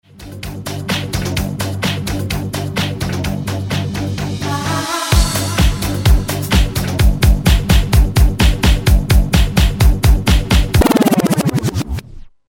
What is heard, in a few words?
Hercules DJ Flippin Double Backspin